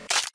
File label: Bone Break